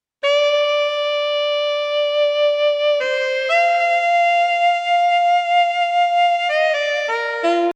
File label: lead